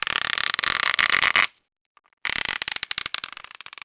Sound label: rope st